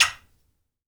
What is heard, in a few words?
TOY DOW, toys percussion